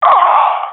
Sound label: death; Counter Strike; CS:GO; professional epic